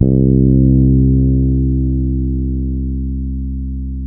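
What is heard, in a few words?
C 3, fretless, bass; Spectrasonics; Bass Legends CD 1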